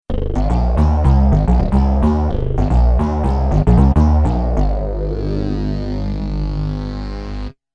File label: grave bass